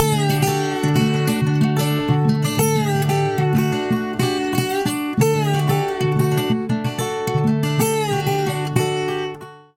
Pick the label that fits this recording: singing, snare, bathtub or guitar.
guitar